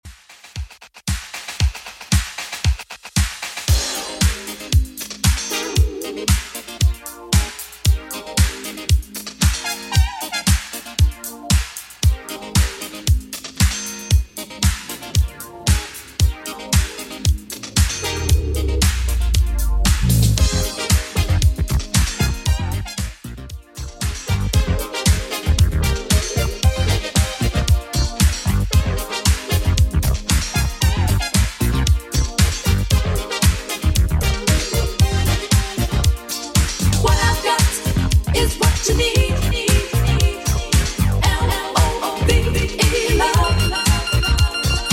80s Redrum 115 bpm CLEAN

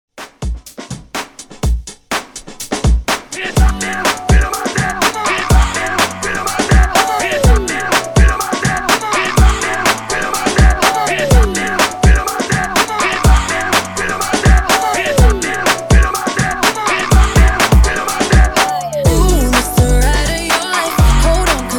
PARTY BREAK 124 BPM

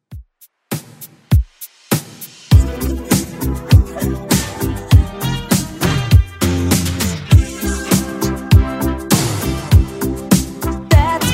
80s Redrum 100bpm

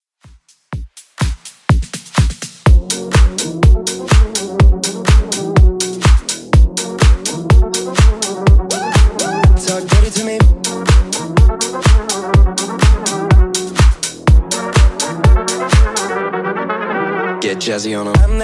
House DJ Friendly Edit 124bpm

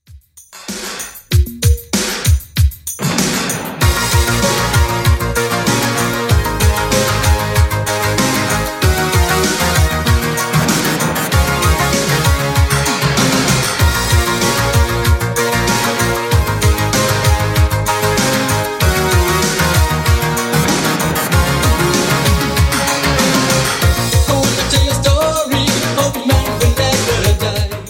80s Redrum 96bpm

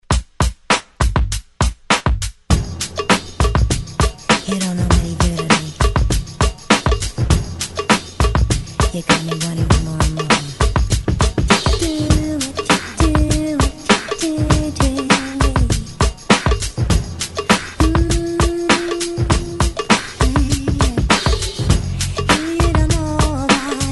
90s Re Drum 100 Bpm; DJDOLLS

